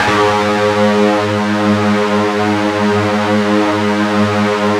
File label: FUZZ G#1, Guitar; ULT FZZ LOOP; East West; Steve Stevens Guitar Samples Collection